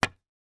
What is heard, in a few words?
sport pool snooker cue strike ball miss hit